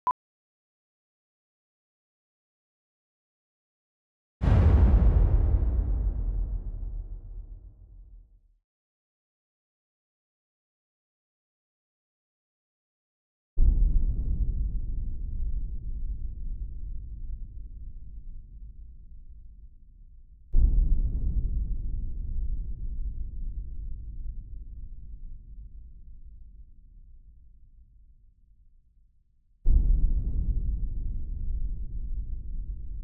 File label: HITS; LAVA FIELD Trailers; Hamarinn2